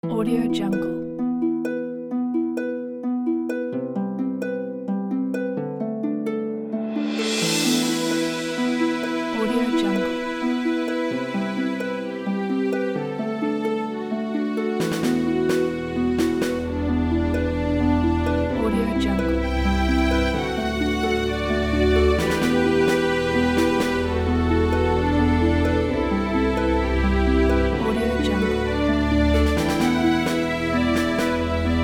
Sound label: military campaign; bestnotesound